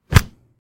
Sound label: brutal hit